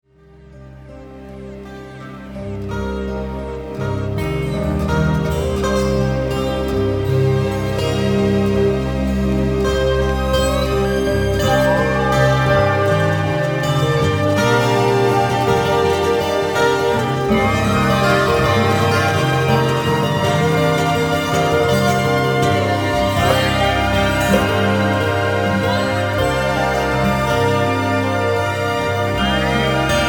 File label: circus underwater